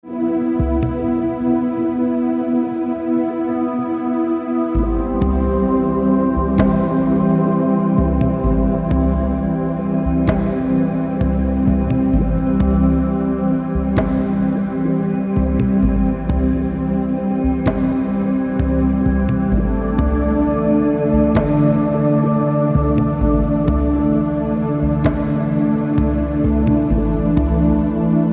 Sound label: rhythm